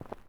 Footstep Stone Light

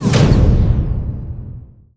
impact mech; Hits, Impacts

